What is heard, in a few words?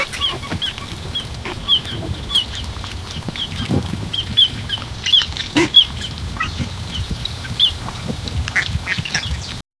crunching sounds of goats; bleat